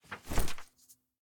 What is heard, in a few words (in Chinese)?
页面转换声